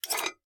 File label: Take Plate; MiSide